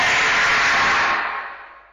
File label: jumpscare